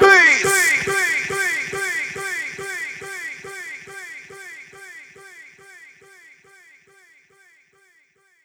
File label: Vocals; Vengeance Samples; Special Sounds